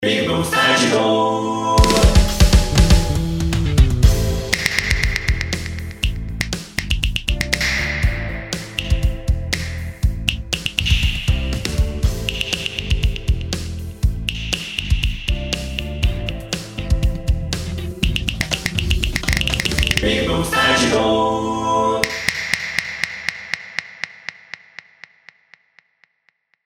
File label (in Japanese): 指パッチン; ASMR; 効果音; ぴぐみょん効果音素材集; ぴぐみょんスタジオ